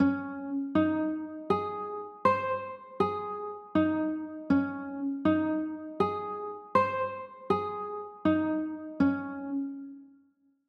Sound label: d flat maj, chords; d flat maj9